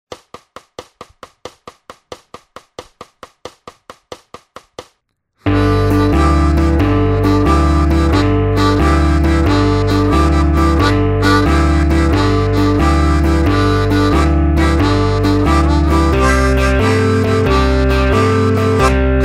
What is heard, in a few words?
Rockin Rhythm MEDIUM